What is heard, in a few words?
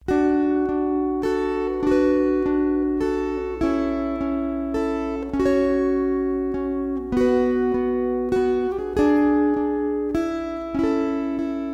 Waltzes